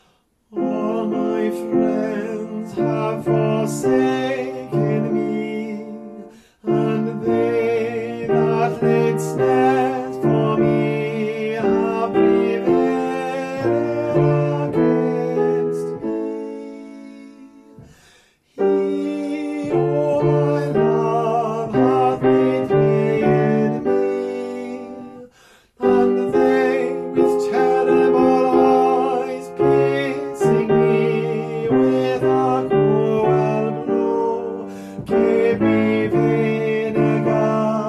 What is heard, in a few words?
tenor